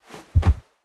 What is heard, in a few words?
Cry Fall Body; raw game audio files; Mila; MiSide